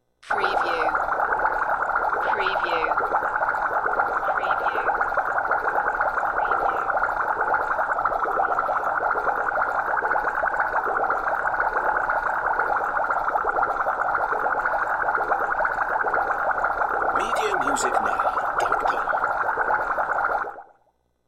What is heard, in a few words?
Boiling Low